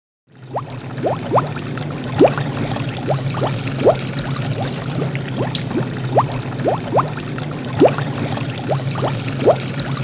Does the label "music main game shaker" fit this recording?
No